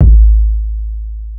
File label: KIK